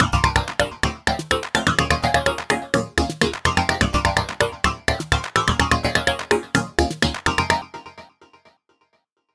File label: The MIDI part and gated effect.; An Ultrabeat is used to playback percussion samples.; A simple drum pattern is programmed to trigger the gate.; Logic